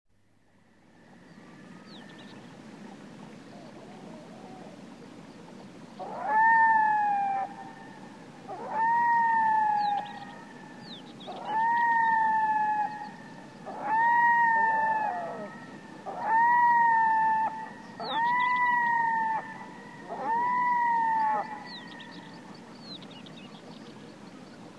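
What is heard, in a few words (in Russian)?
лес птицы крик птиц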